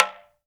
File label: TALK DRM, Heart of Africa; Spectrasonics